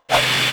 buzzsaw swing miss, weapons; tf2classic

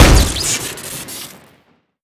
grenade shoot crit, weapons; tf2classic

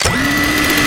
tank mini spin up, weapons; tf2classic

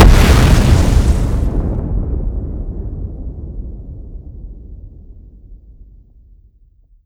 bomb explode; weapons; tf2classic

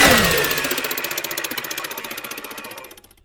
tank mini spin down; tf2classic

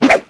cleaver throw; tf2classic

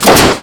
rocket fire, weapons; tf2classic

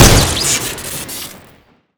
big shot shoot crit, weapons; tf2classic

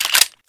magnum mag in; tf2classic

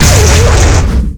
tau explosion charge; tf2classic

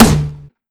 grenade launcher shoot; tf2classic

